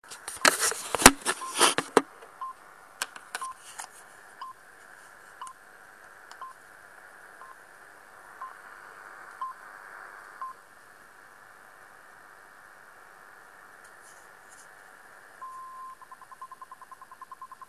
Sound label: Signal RWM 4995 KHz; Radio